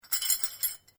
Bell Handbell Priest Japanese Ornamental Rings Rattling